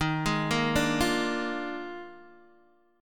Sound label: D sharp Major 7th Suspended 2nd Suspended 4th D sharp Guitar DADGAD, chord